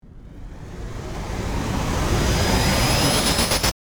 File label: Cinematic whoosh glitch and cut